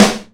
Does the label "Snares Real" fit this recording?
Yes